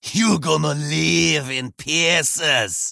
melee; Halazzi

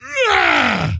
Attack; Ley Guardian Eregos

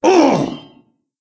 Wound; Stormcaller Brundir

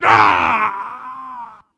Wound Crit; Captain Rupert

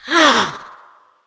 Attack Light, Creature; Eydis

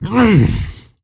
wound; Moorabi

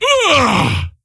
Wound Crit; Ley Guardian Eregos

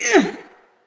Wound Heavy, Creature; Eydis

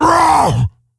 Stonebark Wound; Elder Stonebark

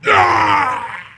Wound Critical; Jaraxxus

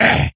wound, creature; Shadron

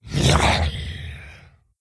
Attack; General Vezax

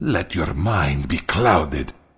Roar; Exarch Maladaar

